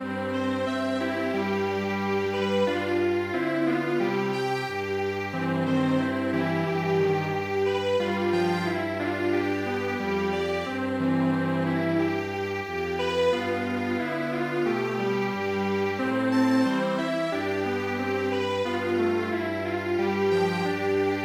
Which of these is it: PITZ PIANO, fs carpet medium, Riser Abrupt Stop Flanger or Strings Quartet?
Strings Quartet